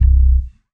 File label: bass stab